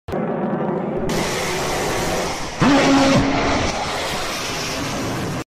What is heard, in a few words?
f16 vulcan